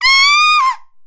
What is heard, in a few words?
Scream; Zelda screaming (turned into a painting)